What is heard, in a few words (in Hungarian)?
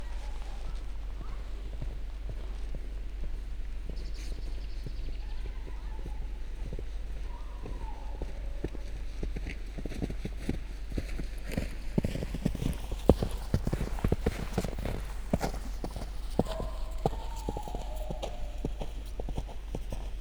gyalogolás hóban